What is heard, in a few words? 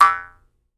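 Jaw Harps, Pitched Percussion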